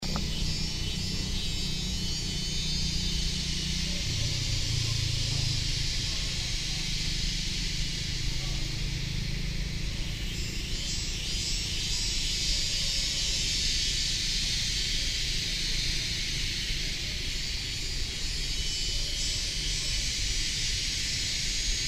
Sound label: Cicada Singing In Yunnan